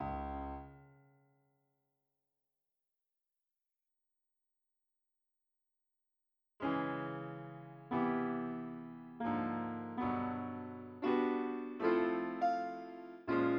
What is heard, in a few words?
piano